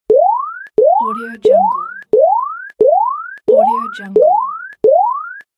alarm warning

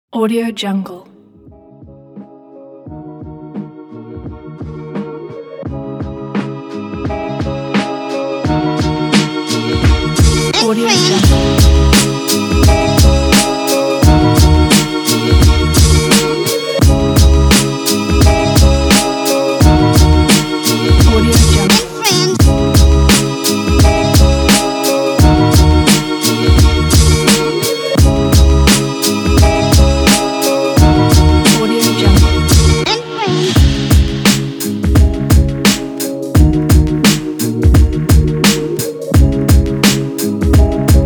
oldschool vibes hiphop

